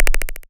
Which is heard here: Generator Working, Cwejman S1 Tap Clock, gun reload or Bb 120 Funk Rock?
Cwejman S1 Tap Clock